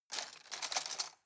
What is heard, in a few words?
cutlery plastic drawer rattle